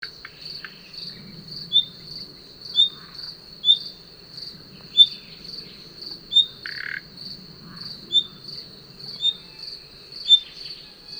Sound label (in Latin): Luscinia megarhynchos; SETE